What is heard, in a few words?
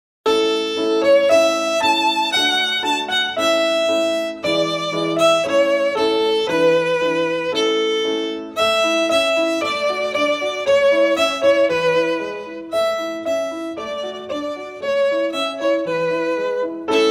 Suzuki Violin Method, May Song, Folk Song